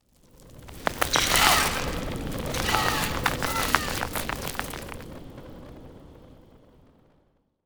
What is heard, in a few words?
rock creatures; rifts; Stellaris; astral planes